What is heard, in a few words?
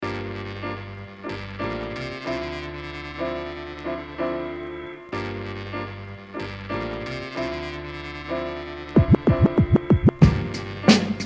Beat